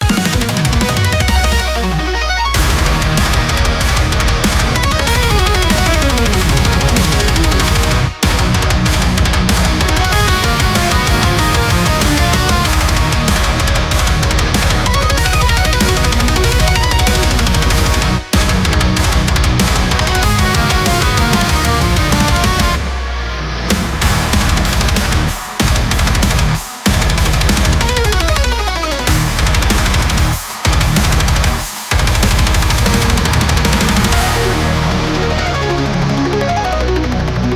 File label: nighttime 95bpm base E